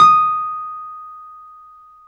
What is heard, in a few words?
PNO T.Wurly D# 7; Keyboards of The 60's and 70's